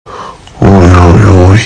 uy uy uuuuyyyyy

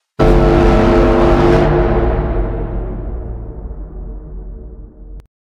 horn

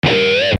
censor sound; metalocalypse

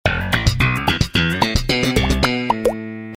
sitcom transition bass riff; seinfeld

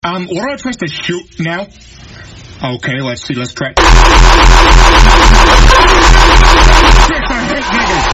hardstyle

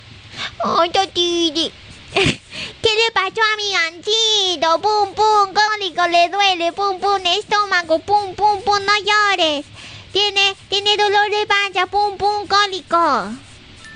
pum pum; ganzito

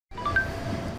DHL scanner

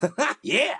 haha yeah

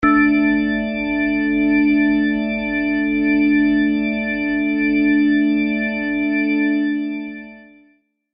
organ; orgue

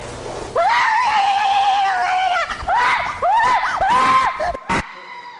scream going down slide

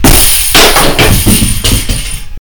cart hit; walmart

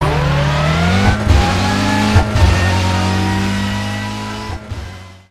bmw m3 gtr

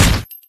shoot; pixel gun 3d; zombie slayer; zombie hunter

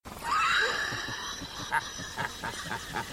laughing; robert baratheon

